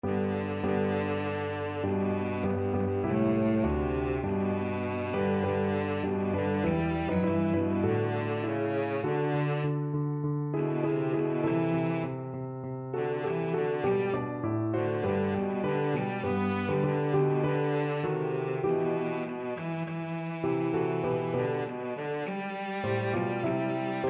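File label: violoncello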